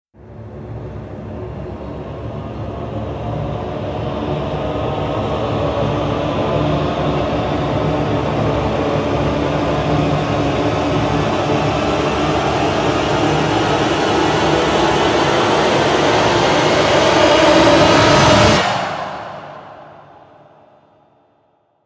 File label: Rise Penetrate; Brand X Music; Brand X Music Catalogue - Editor's Essentials